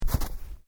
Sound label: Footsteps Boots Snow Step; Footstep Foley; Sound Effects:Human